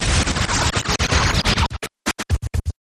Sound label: Interrupted Static